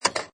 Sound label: Pinball sound, metal clank with return